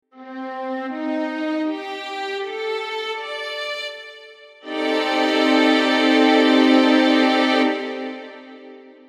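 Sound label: chord strings m69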